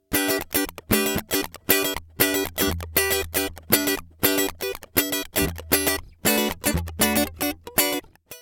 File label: fast track Les Paul Funk